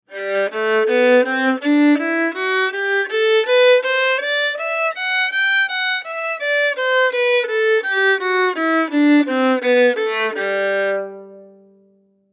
Violin G Maj Scale Up Down; Strings; Blastwave

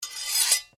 Blade Scrape Flick, Sword

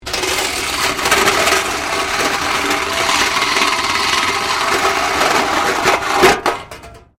METAL BINLID SCRAPE WIRE FENCE; Metal (Sound Effects:Materials)

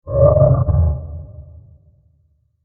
Creepy Horror Creature Monster Transition; Scary Soundscapes; Crafted Signals

